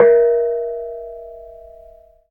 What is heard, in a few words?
KENONG; Spectrasonics; Heart of Asia